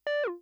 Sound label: phaser down; Kenney's Sound Pack; Digital Audio